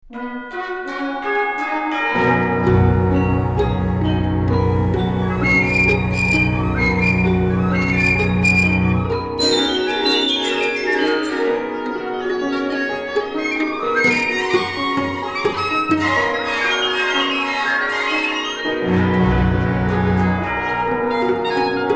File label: Variations for Cello